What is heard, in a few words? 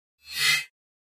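Pop Up Electric Buzz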